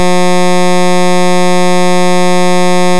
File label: buzz; TEACH